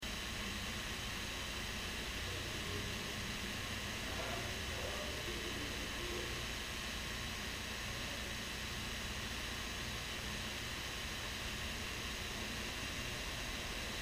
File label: Piano; Raw